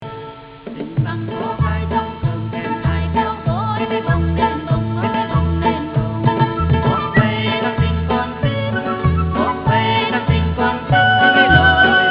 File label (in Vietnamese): trống cơm